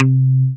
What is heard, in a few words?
Synths C; Vengeance Samples